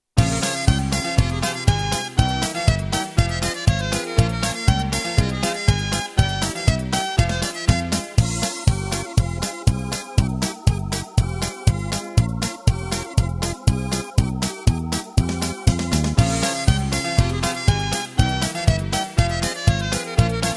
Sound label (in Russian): chastushki fonogramma; muzyka dlya chastushek